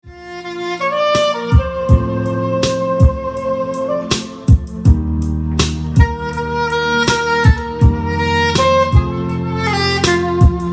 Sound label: SAX